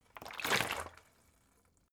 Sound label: bottle water big upend; berufe